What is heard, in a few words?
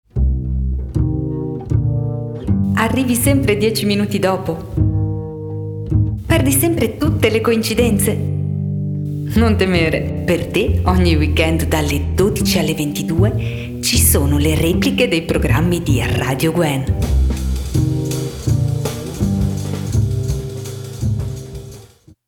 Jingle; repliche